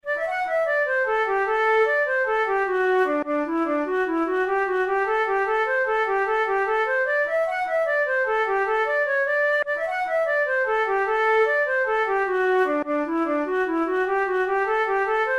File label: banish misfortune, flute